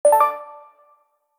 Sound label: call connected audio